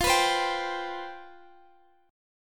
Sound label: C Major 7th Suspended 4th, F, guitar chord